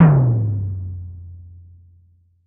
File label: bass classic F; Polyend; ARC Noise